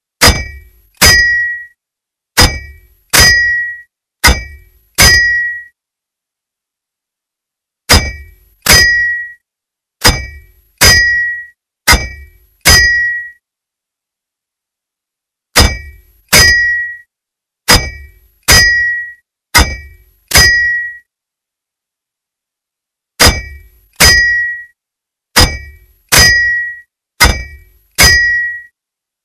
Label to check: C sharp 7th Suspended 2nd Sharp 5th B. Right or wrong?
Wrong